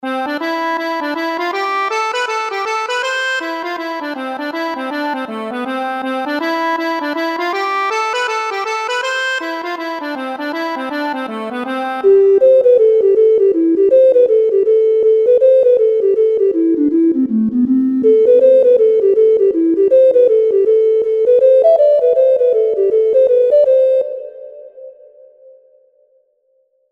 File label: accordion